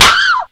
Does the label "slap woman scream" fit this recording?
Yes